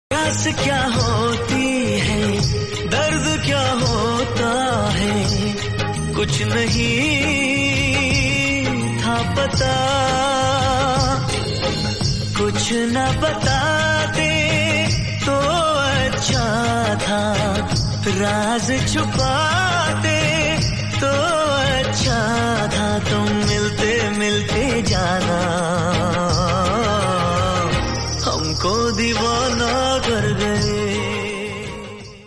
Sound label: Hind Song